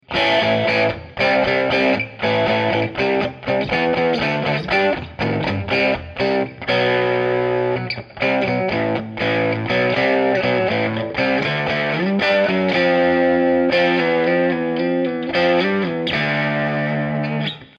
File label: cry